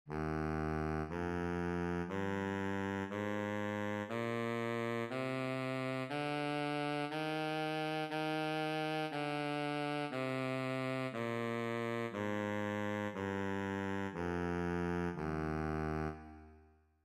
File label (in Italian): Sax baritono